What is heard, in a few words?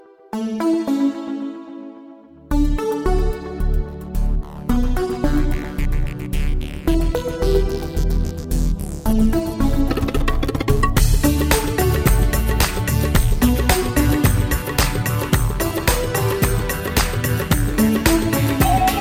techno with tabla; Indian